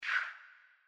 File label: Player hand whoosh; Blackjack